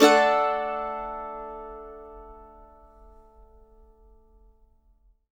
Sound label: mando maj; Sampleheads; Whole Lotta Country 1 & 2